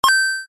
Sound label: coins